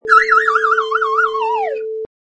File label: Slide Whistle Dive